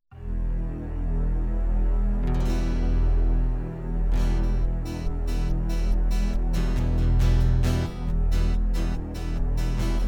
Bird Call Instrumental; hyper terrestrial

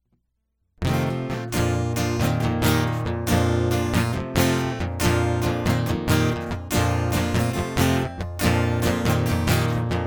Guitar Loop

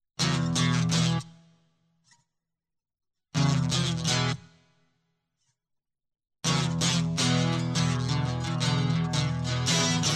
Guitar Loop

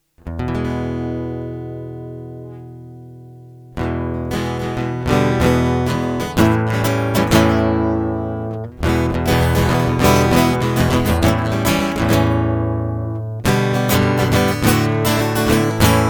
Great Guitar Intro; hyper terrestrial

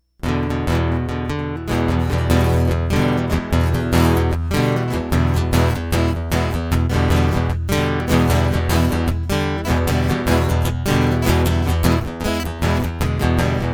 Quite Upbeat Guitar Prelude; hyper terrestrial

